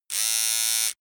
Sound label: servo twangy